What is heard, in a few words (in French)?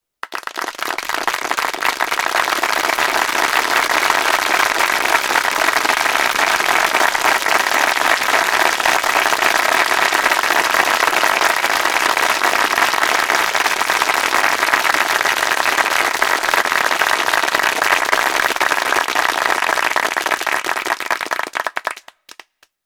applaudissements